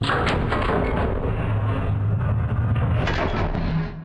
cargo bay hatch closing loop; Factorio

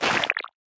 wriggler walk, enemies; Factorio

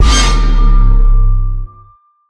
Jump Scare

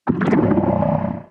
stomper idle big, enemies; Factorio

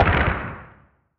asteroid damage interstellar small; Factorio

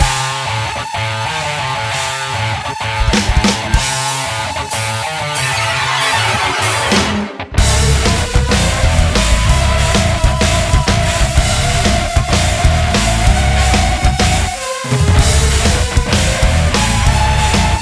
Challenge, music; Movie

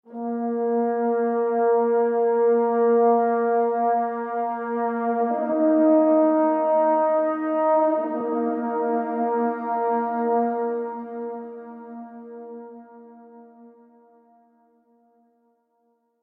space, ambient; factorio

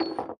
biolab beaker; factorio

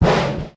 recycler jaw shut; Factorio

